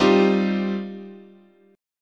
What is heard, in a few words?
G 7th Suspended 4th Sharp 5th, chord, guitar